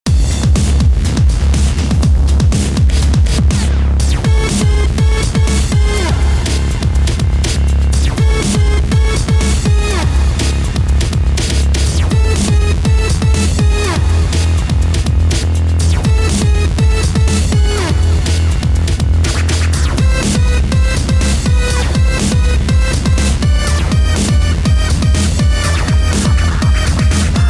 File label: Mini Boss Fight; Intruder Alert Revisited; Bionic Commando Rearmed 2